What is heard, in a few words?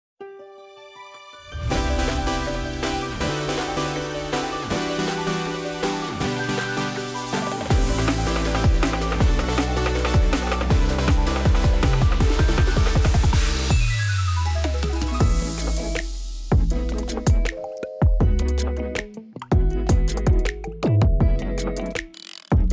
inst